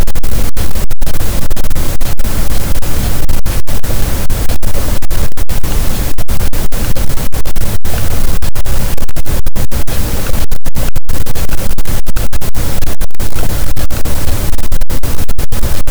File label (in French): cachalot, Physeter Macrocephalus, gaussian noise